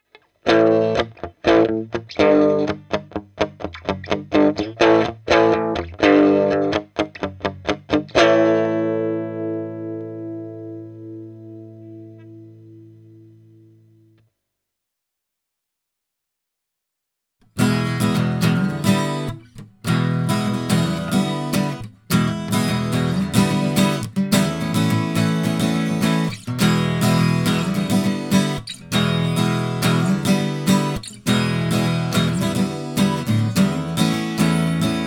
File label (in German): Berlin 47 FT Niere; Antelope Edge